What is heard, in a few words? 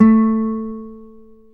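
classical guitar, nylon string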